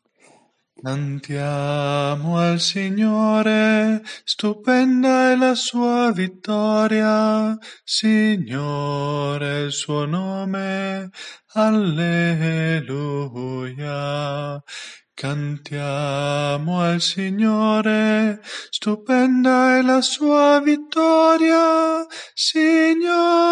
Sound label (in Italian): ritornello tenori, coro